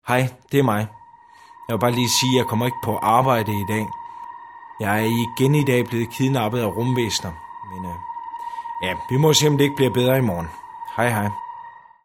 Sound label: ufo; telefon